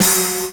TIMBAL; TIMBALS; Ragga & Dub Reggae

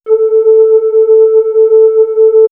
Swirls Flute, pads; X-Static Goldmine

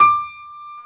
PF, B GRAND PF

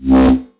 C Bass, DnB One Shots; Electronisounds; DnB Rebellion

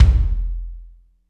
KICK, Tekno Industrial; East West

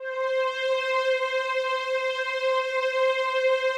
digi pad, Pads

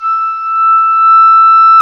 WND OBOE3, Oboe Room; Roland LCDP04 Orchestral Winds; Oboe 4-8

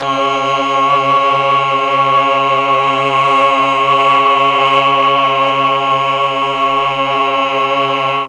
C#2 8VOICE CH, Mellotron; 8VOICE CHOIR; Mike Pinder - Mellotron Archive CD

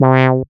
SYN MC WAH, Roland MC-202

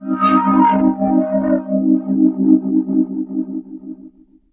SE OVERD., Overdrivin'; E-MU Producer Series Vol. 6 – Analogia Project; Strictly Synthe; SE-1

